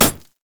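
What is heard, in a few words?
siege rider basic attack; minions; LoL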